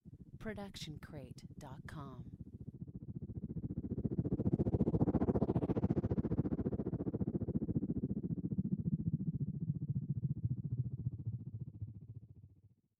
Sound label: helicopter pass by